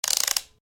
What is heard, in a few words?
Clockwork Windup, Slow Winding Of Small Clockwork Motor; Wind Up Toys; Stuart Duffield